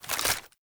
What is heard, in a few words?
AK47 Reload Idle; Warframe; Tenno; Weapons